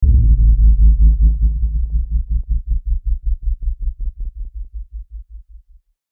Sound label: Pulsing Hit Deep; Ninja Tracks